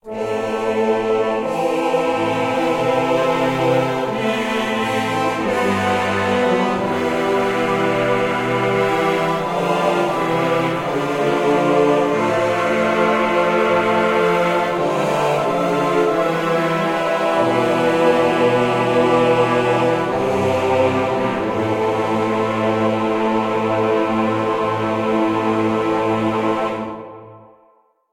Defeat; Puzzle Quest: Challenge of the Warlords; PS2, PS3, PSP, Wii, Windows, Xbox 360

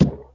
Collision

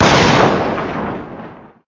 Powerup - Difuse - Thunder Sfx

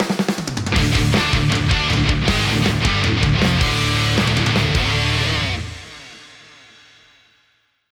Win Jingle; Event Battle - The Champ; Paper Mario The Thousand-Year Door

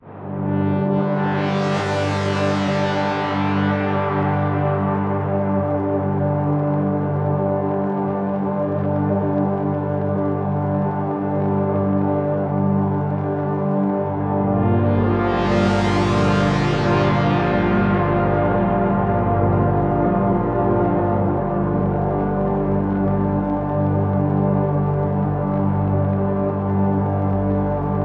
City Ruins Stem; Epitasis

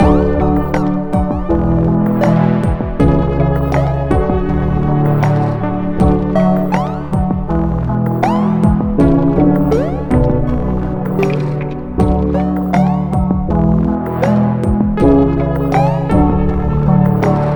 stem bridge; Pix the Cat

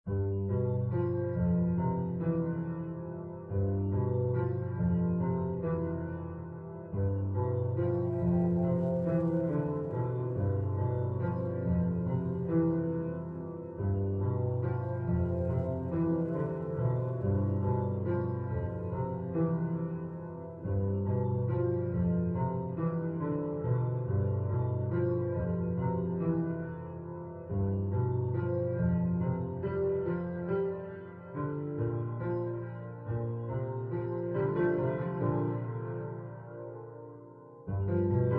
Ambient Music; Lethal Company

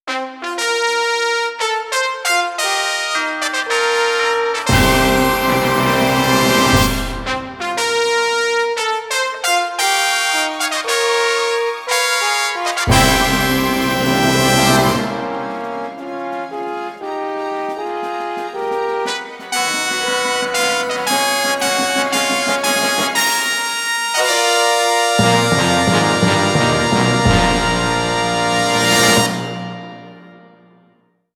Fanfare - London Racecourse

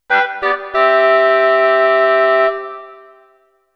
Entering Village Jingle

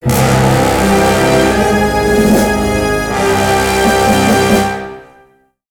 level won

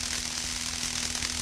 Elec Buzz loop

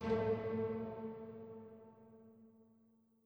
chime; chime sharp

